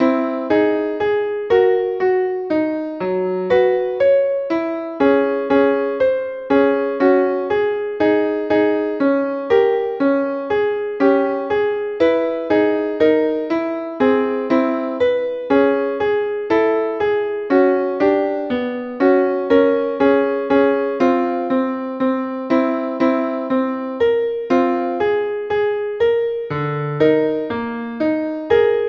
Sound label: music